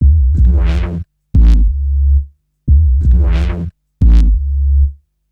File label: Rude Bass, Drum & Bass; Fluid Dynamics